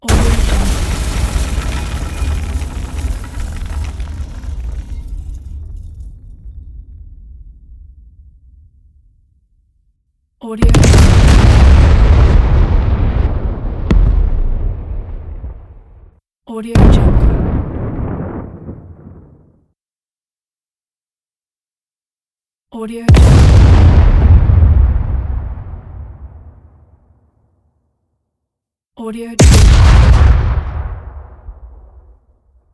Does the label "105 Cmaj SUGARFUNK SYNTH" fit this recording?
No